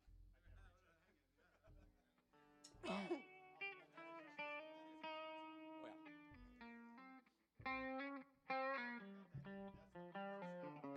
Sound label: Strings Mix; made it to end for first time